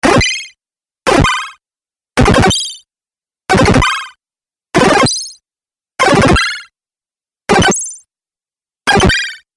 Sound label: 8Bit Aggresive Laser Bleep; video game